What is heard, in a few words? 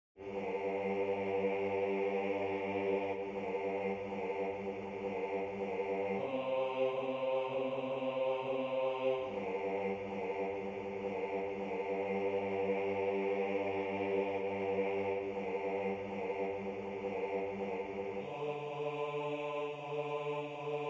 Bass